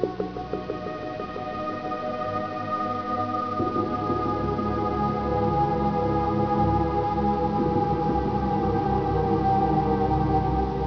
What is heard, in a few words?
matchsticks